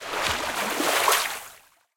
fish Intro; imperialriches

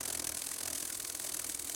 flying Beatle; hiddencoinsofegypt

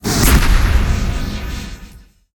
big Win Text; hellskitchen

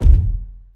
quick Stop Bounce; hiddencoinsofegypt

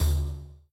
button Stop; robin hood

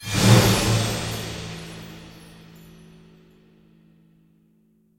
super Mega Win Text Change; wild bazaar

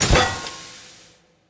Dispatch; eggomatic

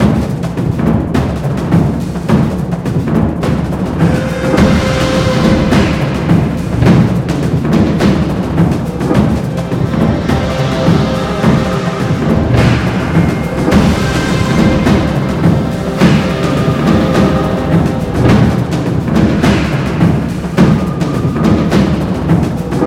freespin music; conan

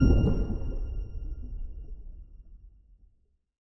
quick stop

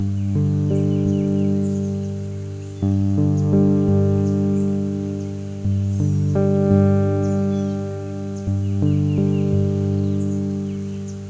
splash Loop; butterflystaxx

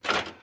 reel Bounce; grandspinn

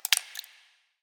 gem Splash; imperialriches

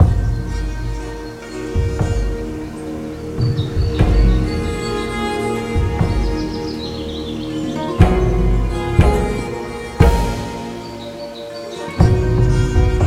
main; riches of midgard

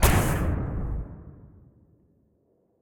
big Win Start; multiplier mayhem

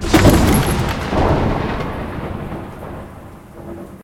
free spin countdown; riches of midgard

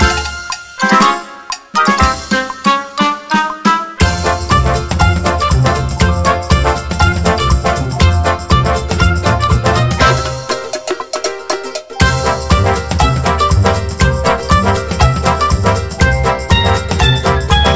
ambience free spins standard; santa vs rudolf

